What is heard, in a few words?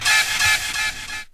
squeaking